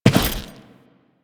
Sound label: Step Default, Footsteps